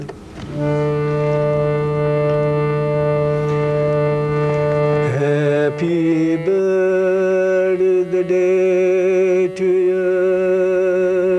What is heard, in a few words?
sings happy birthday; Sri Chinmoy